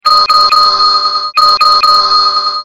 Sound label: Multi Ding